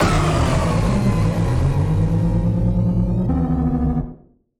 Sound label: Malfunction Synth, malfunctioning synth samples